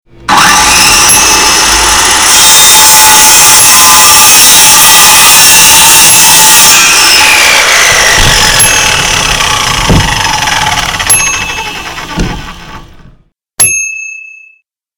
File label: Saw